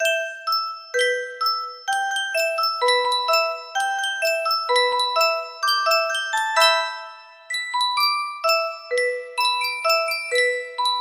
yunsheng music box, music box melody

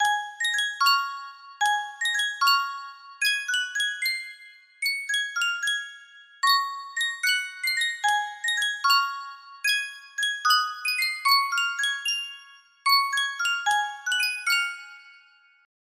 sankyo 12 note music box

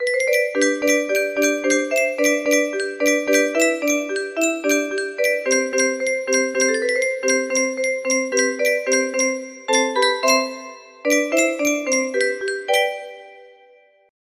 faster tempo, music box melody

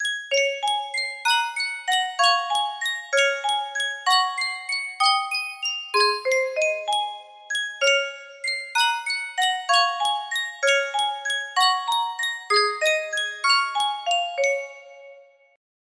Sankyo music box

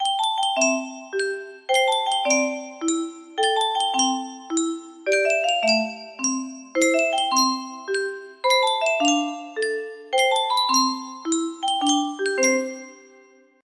yunsheng soittorasia, music box melody

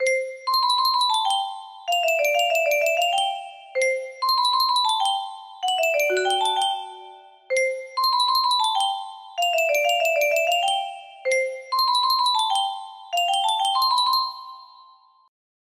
music box

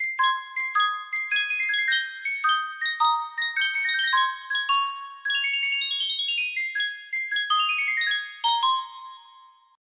reuge miniature music box

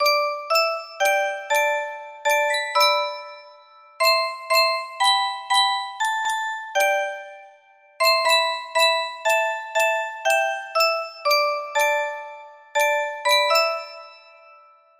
music box melody

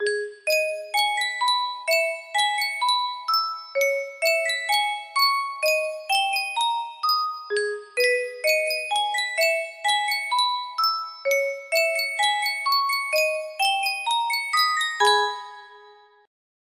yunsheng music box, melody

